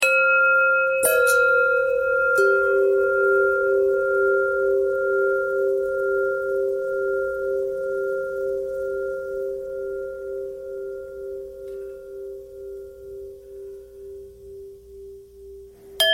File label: long bell bell thread noise; bells